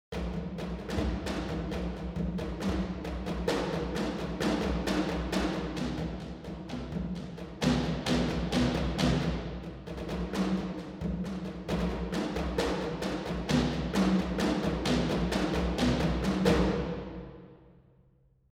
Percussion; Darwin

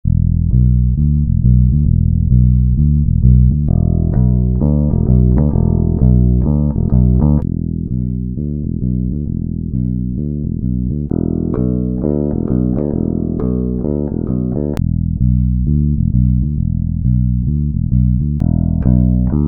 Spector

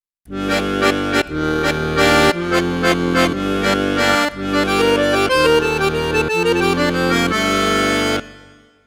Yamaha Akk

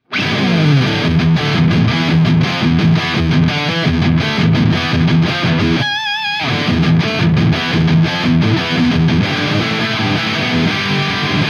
High Gain; Ampman Serie

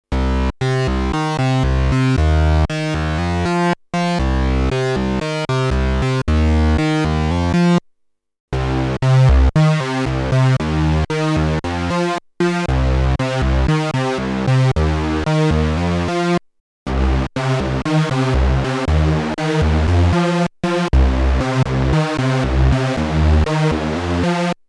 behringer ubxa unisono

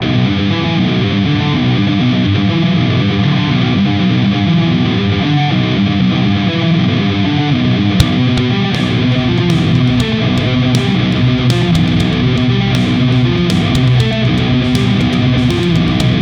FGN J Standard Mythic 7

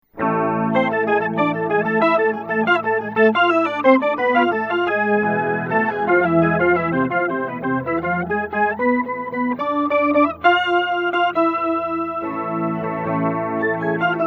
Boss MD 200 Overtone

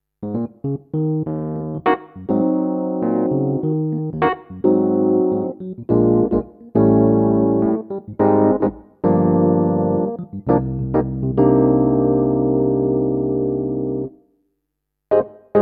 P115 Rhodes

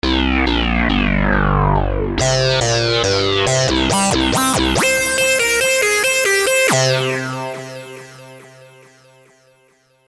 Sequence G

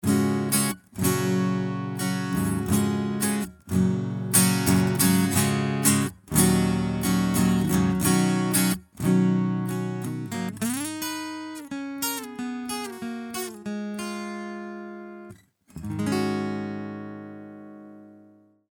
BOSS VE8 GIT ENH red 50

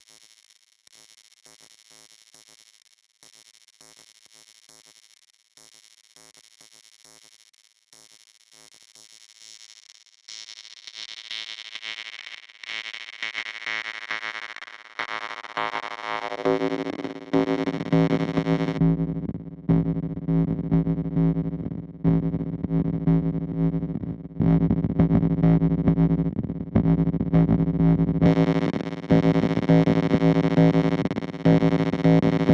EHX Mainframe Drum Machine

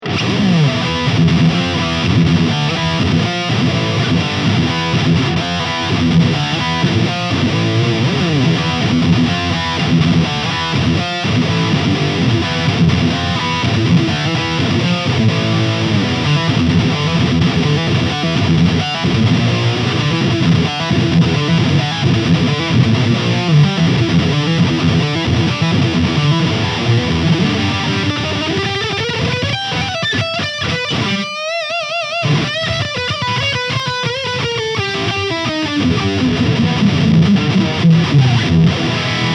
Hughes Kettner Nano Heads Metal

